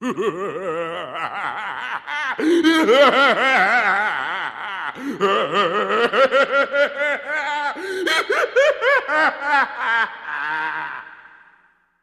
Maniacal Laughter

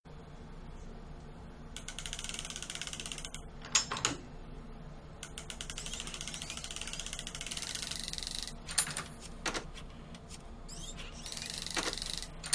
Gadgets Working Room, servos

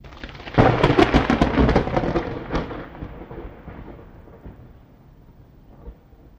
Thunder Air Ripping

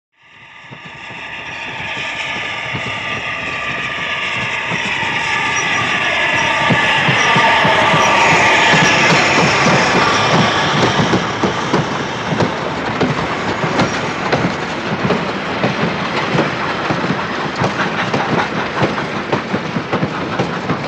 Away Steam Train Clos

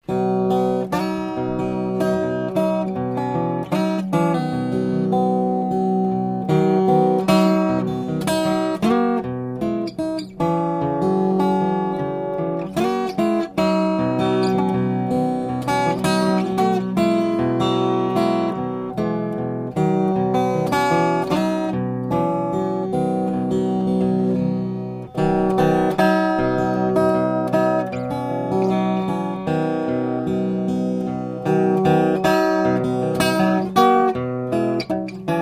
Guitar Plays Red Riv

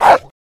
Rottweiler Vicious

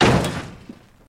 Jeep Crash Metal, car crashes

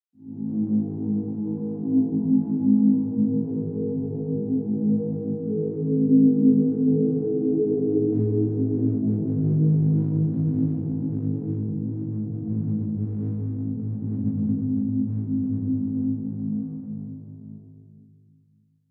Magnetic Pulse Drone

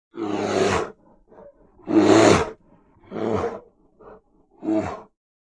Bear Grizzly Growls

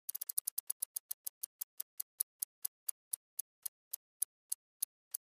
Clock Gear Mechani, ticks

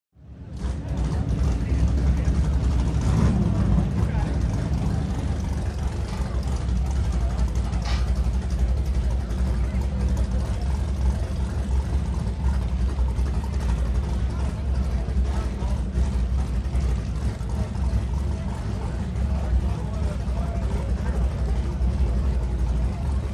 Power Boat Pit Ambi, off shore power boat

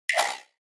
Flash Gear Hollow Rat, flash gear

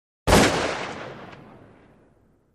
8 Gauge Shotgun Singl

